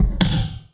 Kick Snare; Esoterik Beatz; Saver Kit

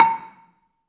BELA2 A4, BELAFON, Asian Traditions; USB Soundscan vol.60; AKAI

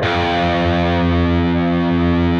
PRS F#, Guitars, LEAD

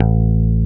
A1 BASS P

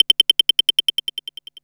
CLICKER HI; Back In Time Records; Korg Universe VOL-1; DRUM FAMILY

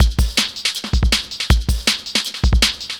Beat, Futurist Drum & Bass; Drums & Percussion

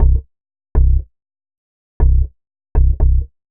Core Bass, Bass Foundations; Sonic Foundry (Sony Creative Software); The Electronic Music Manuscript Collection

